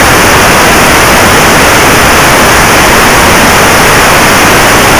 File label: noise